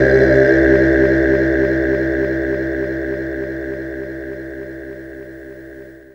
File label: LO VOCAL "OH"